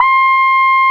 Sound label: QUAC, QUACK SOLO, Synth